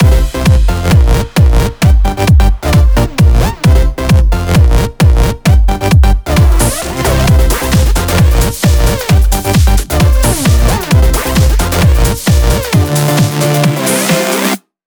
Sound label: 132BPM Mix; Vengeance Samples; Commerce Kit